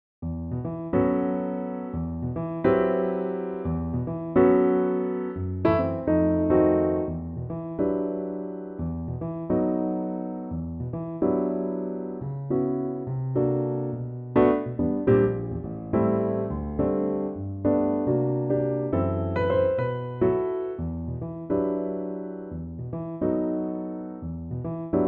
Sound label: piano